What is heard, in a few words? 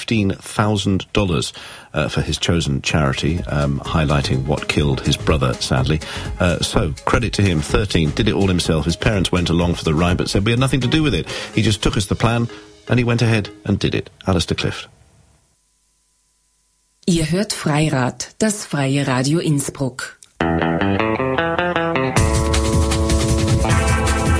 Jingle